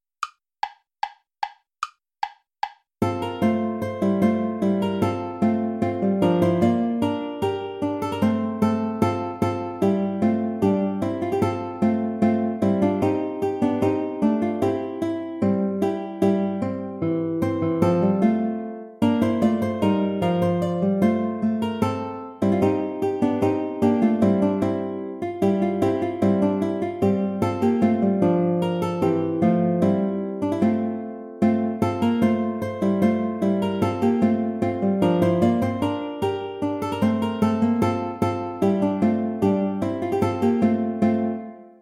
bourree iii play along without guitar; praetorius michael

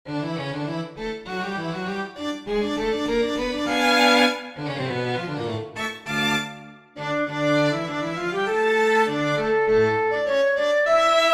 for flute strings; schubert franz peter; winterreise

